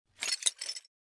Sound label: glass fit; anemoi